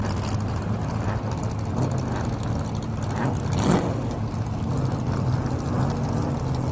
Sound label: race start idle revs; lightning speed